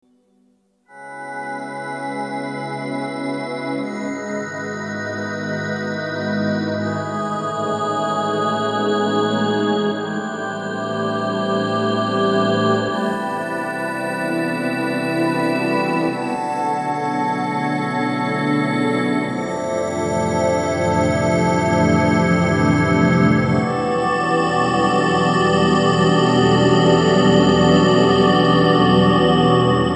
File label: chill